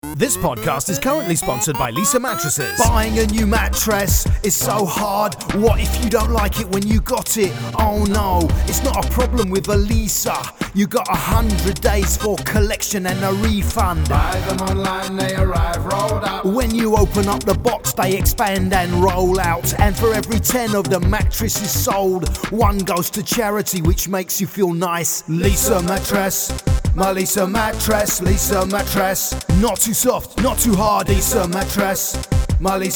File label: HIP HOP JINGLE; LEESA MATTRESS; ADAM BUXTON SPONSOR JINGLES